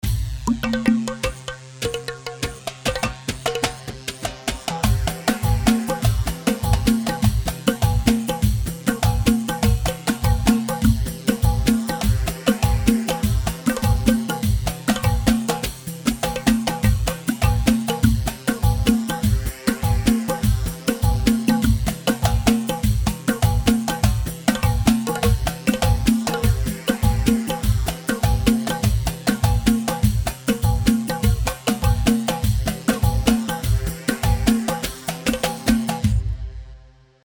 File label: Synth, Bandari 4/4 162; Atba Synth 2/4 96 عتبةسينث; khaleeji